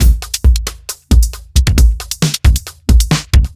Dance Beat; Pro Tools Essential Loops; Dance DJ; Beats

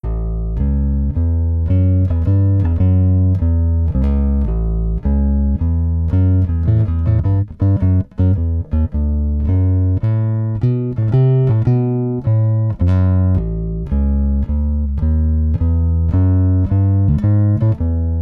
Blues Bass; Pro Tools Essential Loops

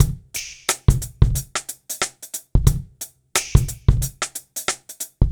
090 Pop Rimgroove; Pop Rock; Moderate Tempo; Pro Tools Essential Loops; Digidesign; Drums

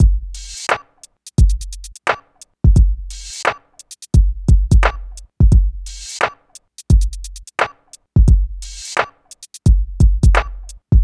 Hip Hop Beat, Drums; Digidesign; Pro Tools; Pro Tools Essential Loops; Drumbeats

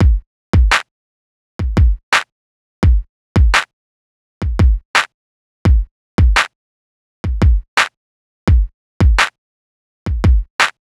Hip Hop Beat, Drums

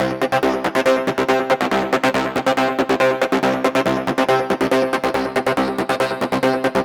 140 House Arp Cmin; Pro Tools Essential Loops; Dance DJ; Synth; Arps

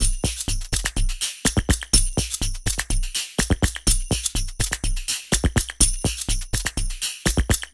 124 Dance Perc Beat; Percussion; Pro Tools Essential Loops; Digidesign

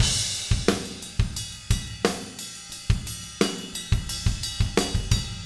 088 Rock Drums Chorus; Pop Rock; Moderate Tempo; Pro Tools Essential Loops; Digidesign

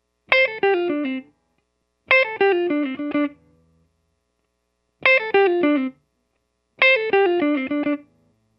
102 Pop Guitar Fill D min, Electric; Pop Rock; Electric 100-160 bpm; Pro Tools Essential Loops; Digidesign

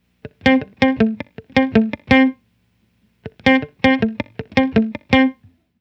HipHop Guitar Dmaj; Digidesign; Pro Tools; Pro Tools Essential Loops

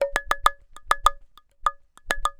100 Dance Minibongos; Percussion; CongaBongo; Pro Tools Essential Loops; Digidesign; Dance DJ

